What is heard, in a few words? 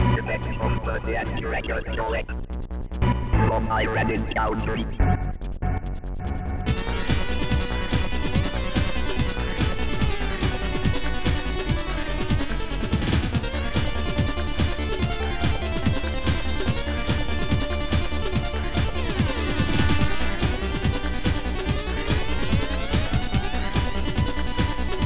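keygen song.xm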